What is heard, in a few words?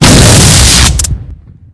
electric explosion; hl2; impact